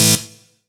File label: saw lead synth; Techno Trance; Ueberschall; Techno Trance Essentials